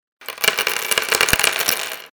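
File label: sound of scattering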